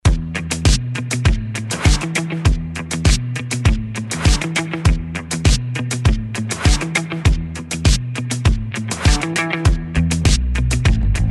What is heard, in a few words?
rhythm blues